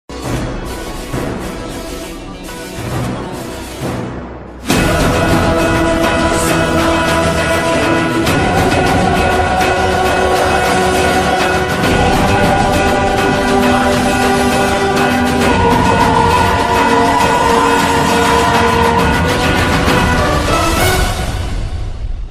interval bgm ringtone, mass bgm ringtone; ponniyin selvan; karthi

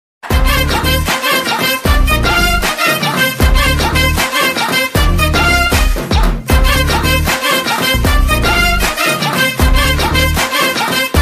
bgm ringtone tamil ringtone song ringtone

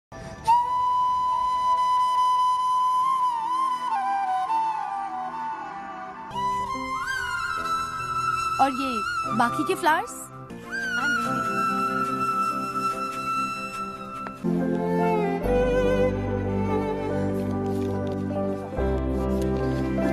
bgm ringtone sad bgm music ringtone

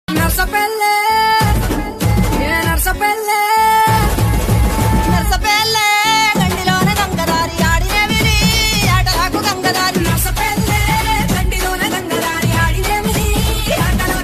folk song bgm ringtone

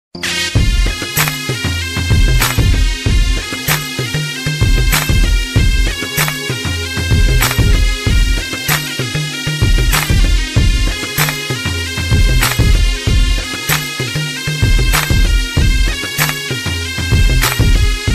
remix bgm ringtone feeling ringtone; vallavan

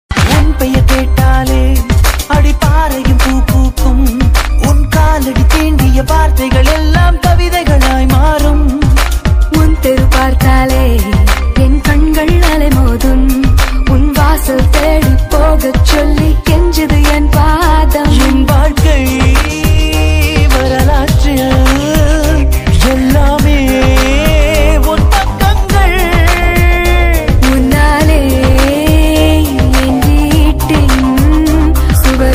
song bgm ringtone tamil love bgm; tamil trending bgm

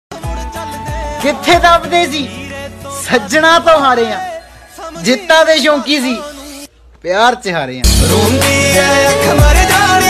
punjabi sad song

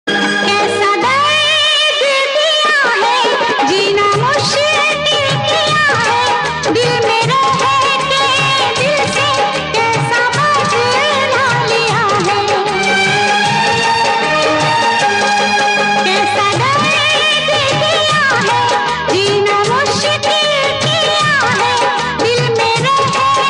best hindi ringtone

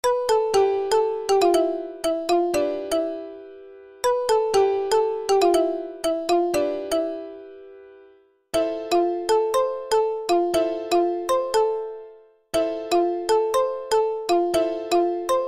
marimba ringtone

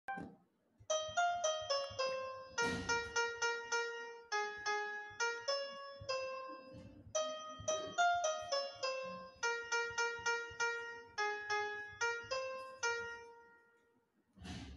mother bgm cover; kgf

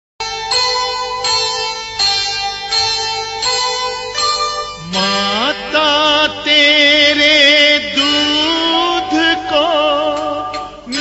hindi ringtone love hindi song; mahabharat

